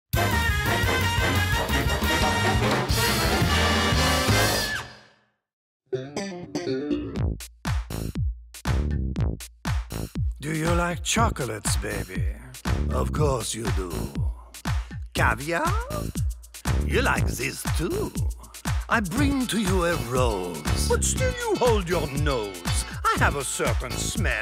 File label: sing along; merry melodies; pep le pew; looney tunes; wb kids